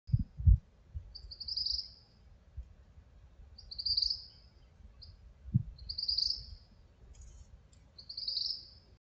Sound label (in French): Tyran gris Tyrannus dominicensis; Pipirit